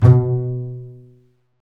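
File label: strings, contrabasses pizz; String Master Series; Cbs FX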